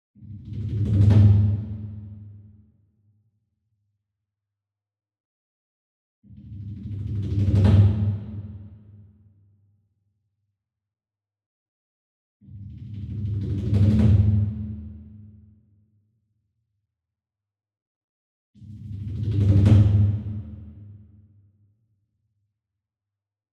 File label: SINGLE DRUM Kodo Roll Soft Beater Far, Cinematic Strikes; boom library; Cinematic Strikes Construction Kit